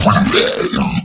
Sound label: boss spit blob barf